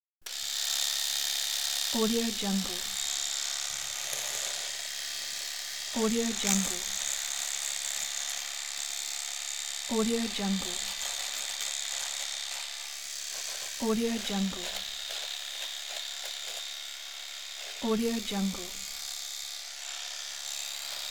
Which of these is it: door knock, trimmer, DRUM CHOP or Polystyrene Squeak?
trimmer